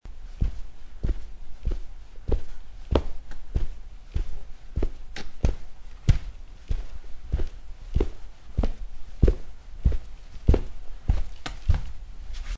Footsteps Wearing Socks

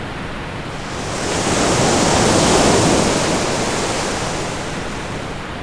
ocean wave

